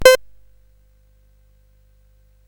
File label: Paddle Mania, CSG8580R5